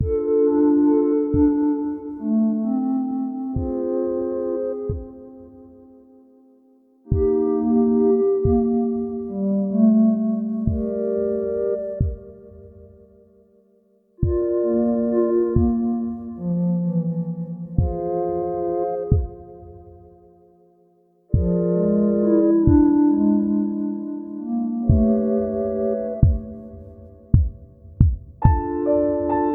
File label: Speech Dark; SanctuaryRPG